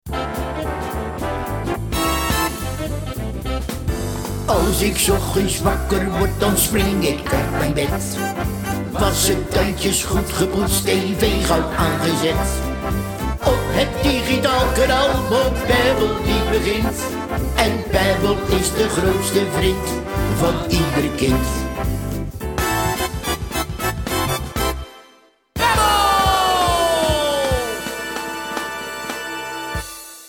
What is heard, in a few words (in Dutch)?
lied; bassie pebble